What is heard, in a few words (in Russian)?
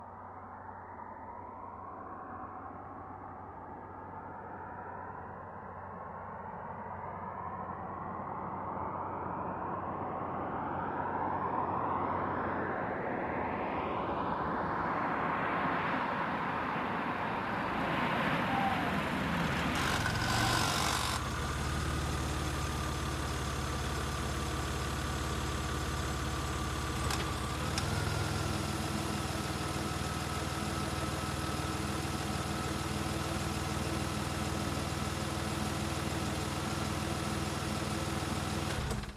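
priblijenie avto, approaching car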